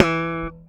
Slap One Shot E, Bass; Bass X

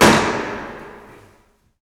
AFRO JUMPE, LRG DRUMS; Spectrasonics; Heart of Africa

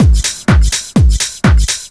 blockbuster, Deep House 125bpm

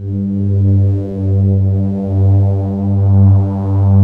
SYN WHISPRS; Pro-Rec - Synthsonic; Z1 PADS

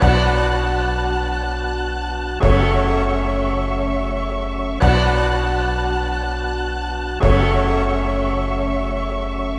Thunder Strings; Sonic Foundry (Sony Creative Software); Club Joints and Street Anthems

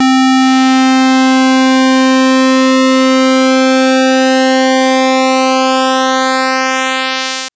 Synth Pulse Sweep c3

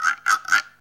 GUIRO SCRAP; PERCUSSION; AMG - Vince Clarke Lucky Bastard

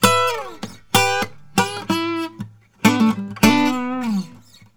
Blues Guitar lick; ILIO; Fretworks; 96 HIRUM LICK

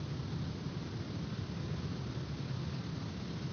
Ambience Loop, outdoor

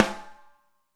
Snare, Alesis DM5

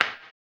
HAMBONE, Vocal Blues Soul

